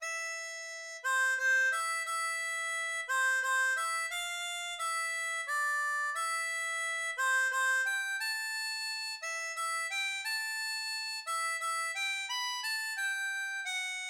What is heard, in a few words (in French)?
Valse, harmonica; Brahms